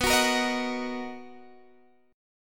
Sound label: B Major 7th B down Guitar Drop D